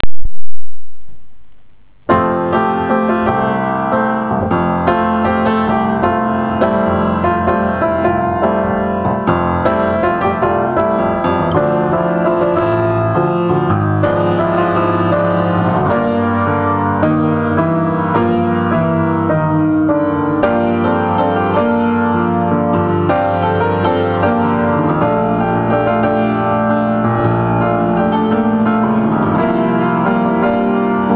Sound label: Piano Composition